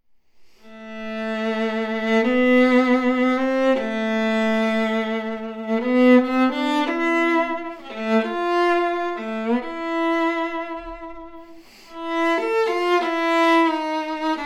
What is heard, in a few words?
viola